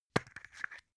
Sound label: plastic spray can small open clatter